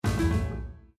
Hardware Fail; Cityscape

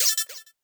Energy Drop; A Robot Named Fight

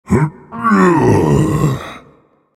Vocal Efforts Light; Minecraft Story Mode; Colossus

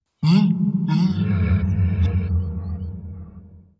whale

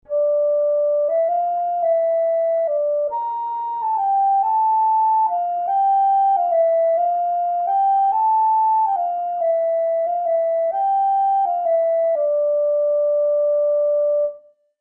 Flute; Tom Clancy's Splinter Cell Conviction

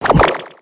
butchered step

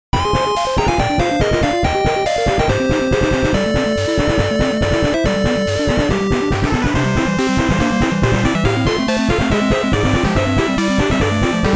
Game Clear; rockman 4 minus infinity; nes

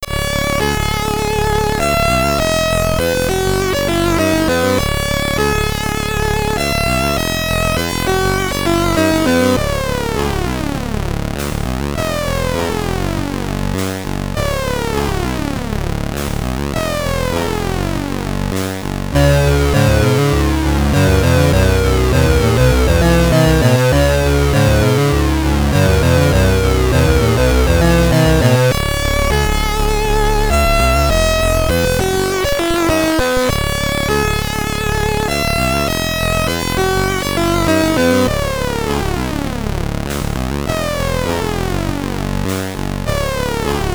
Game Over (SID Stereo), commodore 64; Sidewize

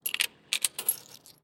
Carwash Token

